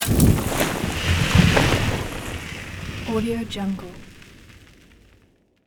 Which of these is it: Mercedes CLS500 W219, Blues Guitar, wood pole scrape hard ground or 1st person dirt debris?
1st person dirt debris